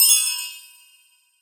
snap; zoom

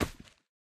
stone impact; jewels

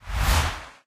whoosh short; jewels

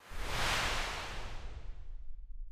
transition, jewels

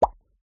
flower destroy; gardenbloom

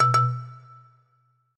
purchase failed; tntbomb

